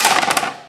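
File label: physics clipboard impact medium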